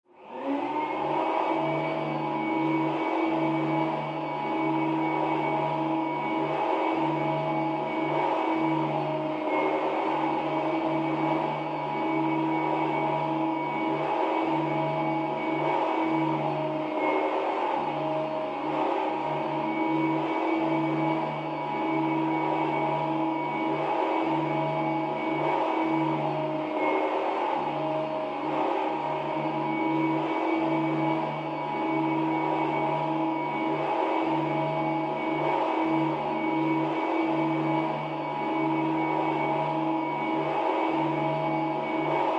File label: Vacuum; Various Movements, From Next Room.; Fans